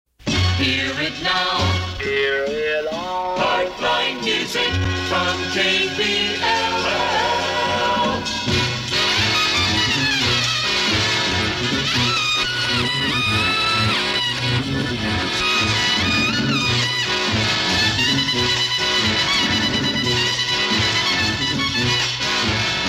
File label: HeartTime Music (Noisy)